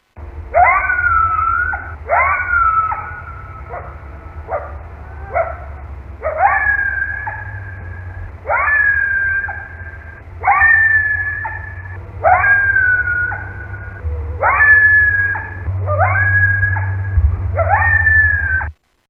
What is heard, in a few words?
Coyote Call